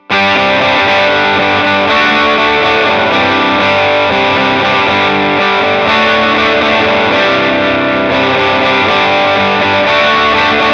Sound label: Overdriven 1973 SG Special Stock Pickups